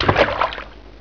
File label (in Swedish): Plask; Dubbelmoral!